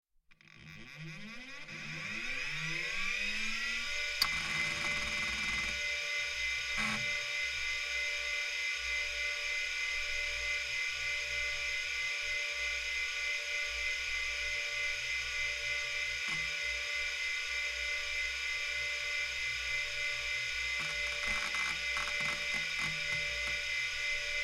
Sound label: Computer; Pc And Fan Startup, Hard Drive Access And Shutdown.